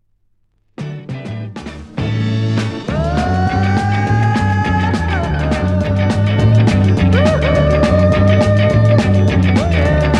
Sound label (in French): la vie en blues